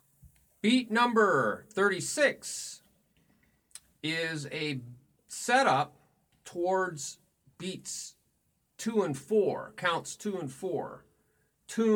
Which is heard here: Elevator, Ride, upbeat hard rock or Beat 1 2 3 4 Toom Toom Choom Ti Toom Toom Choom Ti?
Beat 1 2 3 4 Toom Toom Choom Ti Toom Toom Choom Ti